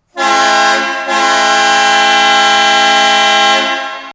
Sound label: Marine Triplex, horn sounds